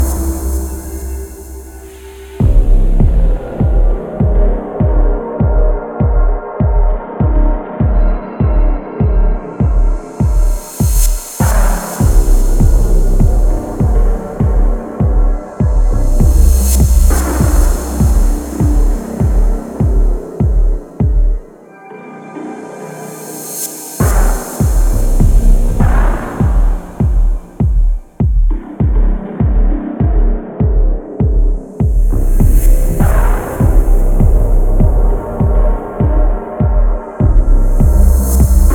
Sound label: menu music; death by waveshaper; LD54